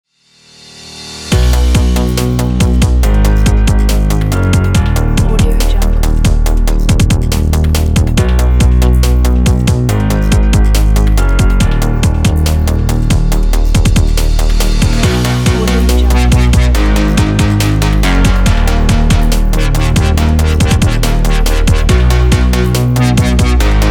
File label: light dubstep technology